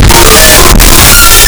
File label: jumpscare sound effect; ambush